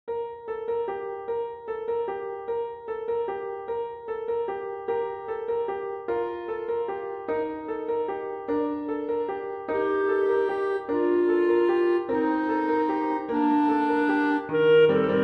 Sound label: carol of the bells clarinet and piano; christmas music and carols